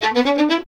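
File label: STR violin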